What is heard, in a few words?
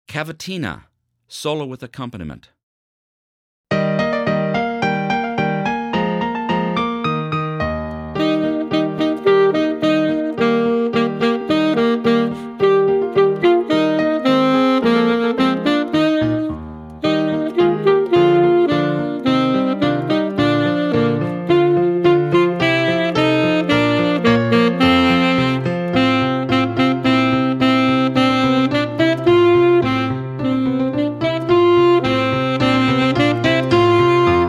Cavatina Solo w: Acc